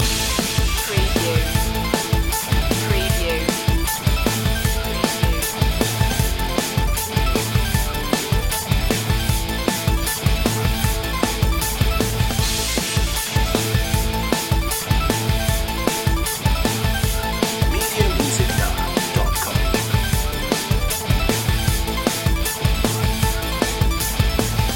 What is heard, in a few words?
crash course loop